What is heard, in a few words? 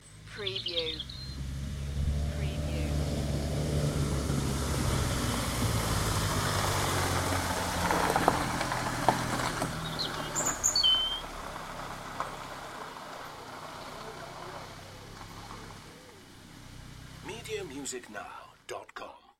Vehicle driving on to gravel drive in a rural setting; beanstalk audio